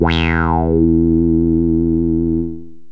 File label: wah pulse, yamaha rm1x synth bass and lead